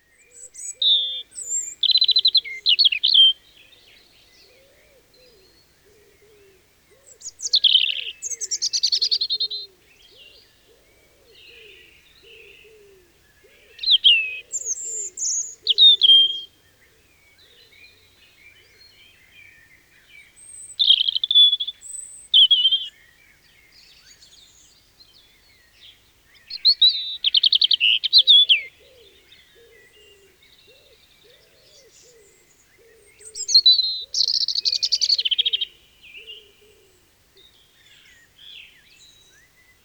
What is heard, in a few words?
Robin, birdsong